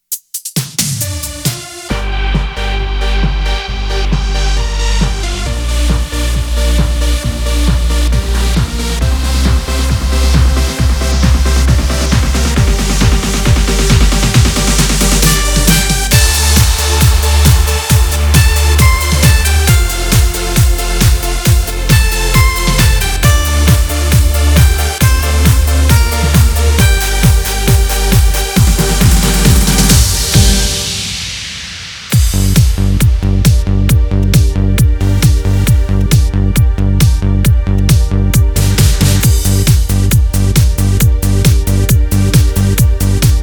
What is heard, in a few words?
remix, genos